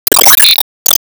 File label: Enemy Active Charge Common Full; Disney Star Smash; Android, iOS, Mobile